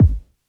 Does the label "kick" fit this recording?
Yes